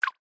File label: message unread; socializer